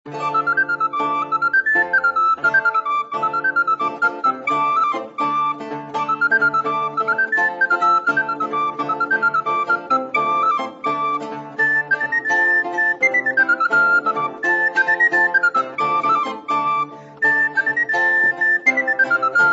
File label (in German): musik